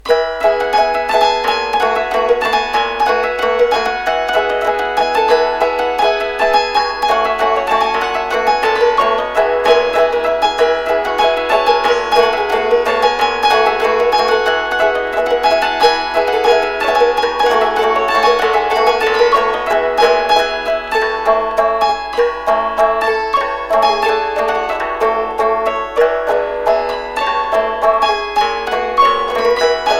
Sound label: Polka